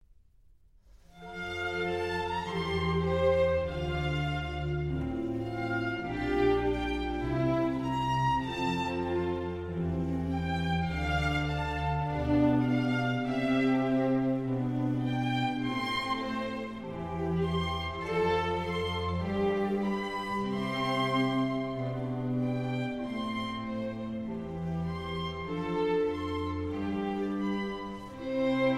Concerto for Strings and Continuo in F major, Andante; Vivaldi